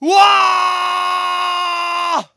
Warcry; Knight Online

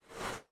Mech Footstep Texture Rewind; starbites

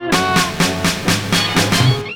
Chorus Break 4 Beats

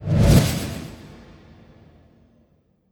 Whip Swi; Legend of Keepers (gamerip) (2019)

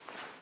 Sfx Baseball

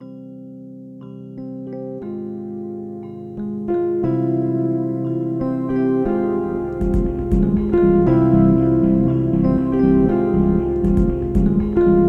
Suit Up Loop 119 Bpm

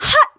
Stun

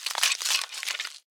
Skeleton Retreat; Folk Hero

